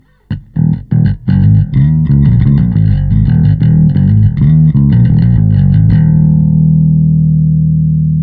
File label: Bass Drive w String Edge; Joe Chiccarelli; bass preset